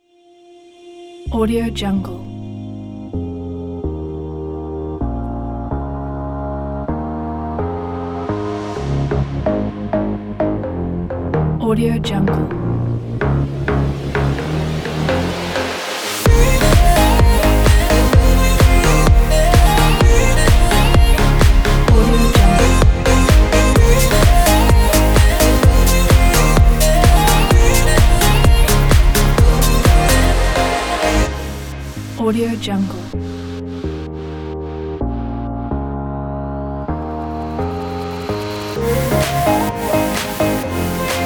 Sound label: dance; loopslab